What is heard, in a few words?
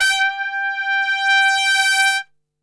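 G 3 trumpet; Best Service; Brass Super Section; TRP SWL; 90 s Sample CDs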